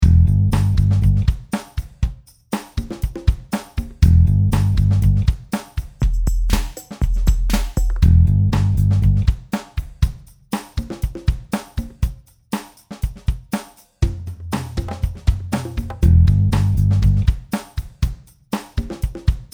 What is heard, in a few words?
kyl full on bass